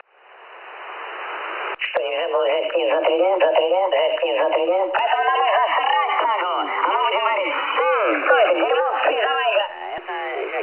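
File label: Time Signal Station Time Signal AM; 14996,0; Moskva-Taldom; RUS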